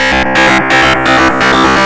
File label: GRUNGE